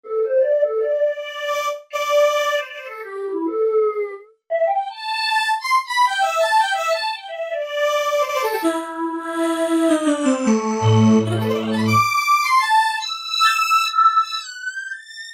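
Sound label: E-mu Morpheus, Lead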